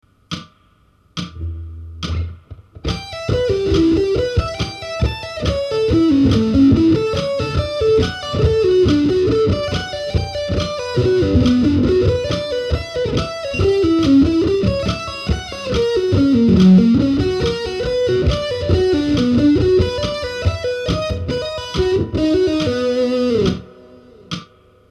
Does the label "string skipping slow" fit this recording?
Yes